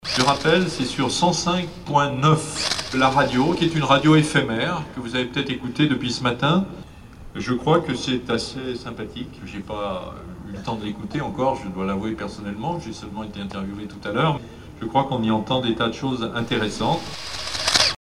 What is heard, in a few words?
Jingle; 2000-Chalon; Maire